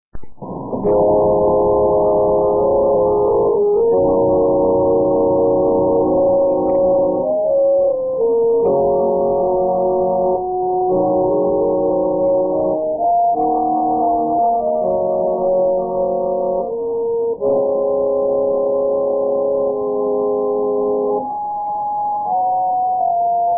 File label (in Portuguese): orgao